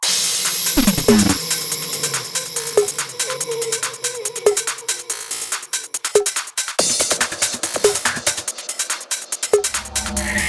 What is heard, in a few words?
trance psytrance mix